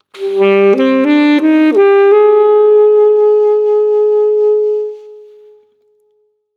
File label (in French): impro saxophone; loto sonore